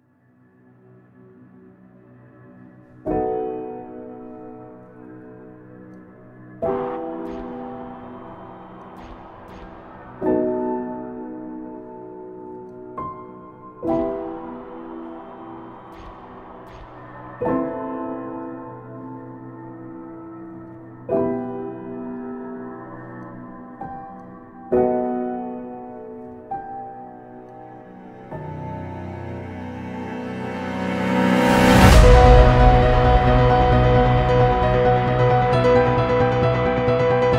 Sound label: Cinematic Piano Cover